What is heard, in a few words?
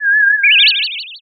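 uguisu, Japanese bush warbler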